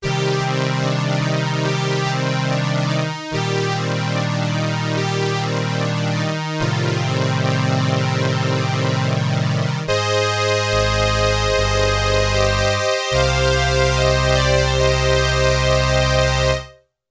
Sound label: STRINGS I with Ensemble II, Roland RS-202